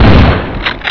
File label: shotgun, Shotgun shot; Turok